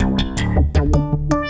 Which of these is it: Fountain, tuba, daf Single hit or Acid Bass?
Acid Bass